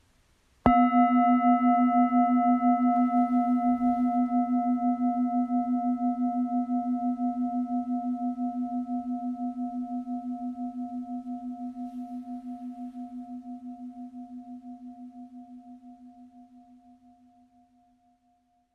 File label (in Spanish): Campana Tibetana